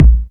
hot bass drum one shot a sharp key

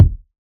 puffy bass drum sample e key

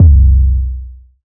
long bass drum sample c key

